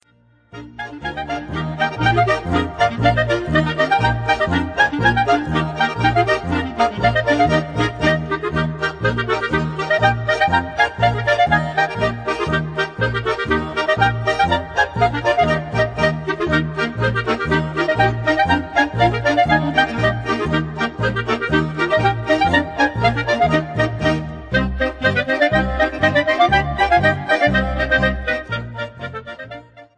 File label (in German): Schottisch aus dem Klettgau